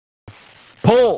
pull

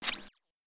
jump plastic; fallguys

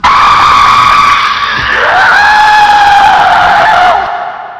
alert; ragemap2023; slasher; slasherghost

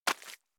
footstep slippery; fallguys

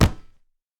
ball hit; fallguys

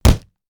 door hit; fallguys

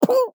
player hit; fallguys

